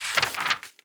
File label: bet slip open bet slip; netent